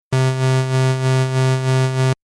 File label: synth lfo trem